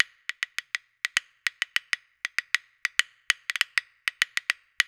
PERCS 100BPM; INIS - Opium

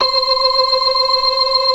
BLOOD OCT R, ORGAN

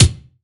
BD, BASS DRUMS; EdgeSounds

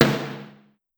Snare, Pitched Down; Clavia DDrum-AT

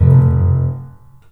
THUD, The Piano; InVision Interactive

